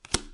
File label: drop; freeCell